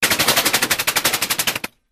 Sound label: Bullet Impacts Metal